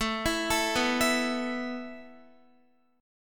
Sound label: A Suspended 2nd A Guitar; Asus2; arpeggiated; x,12,14,14,11,11